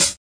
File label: hat